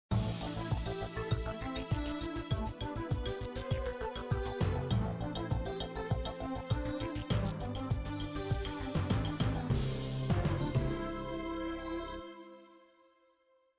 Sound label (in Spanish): musica